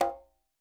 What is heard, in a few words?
QUINTO, Percussion